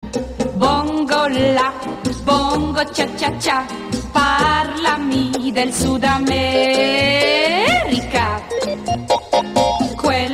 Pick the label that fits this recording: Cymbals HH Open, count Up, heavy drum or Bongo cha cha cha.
Bongo cha cha cha